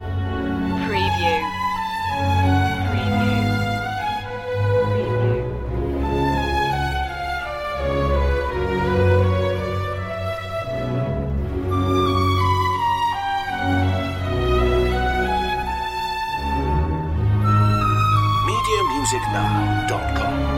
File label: coda large orch no harpsichord; Quia Respexit